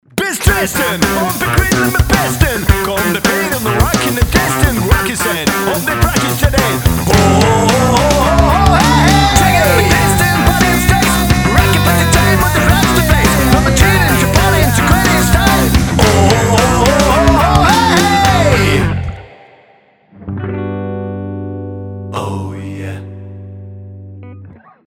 ROCK n RAP; Большая разница

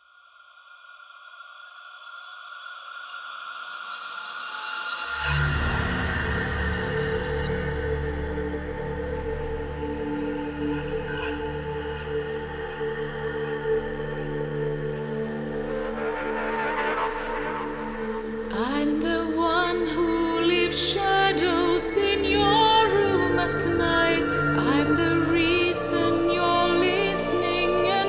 Ambience Sub Low Mix

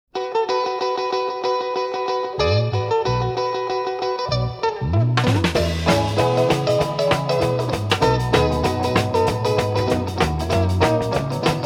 Guitar Boogie

